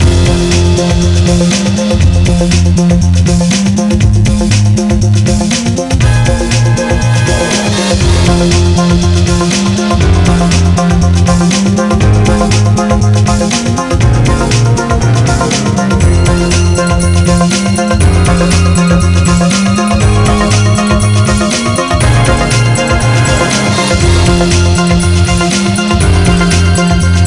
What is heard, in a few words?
Music; Street Football II; NDS